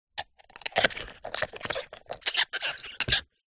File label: hot water bottle rubber plastic bung insert screw tight; household